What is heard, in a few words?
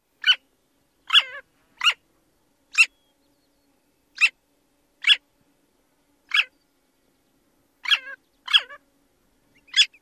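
alarm calls, long-tailed jaeger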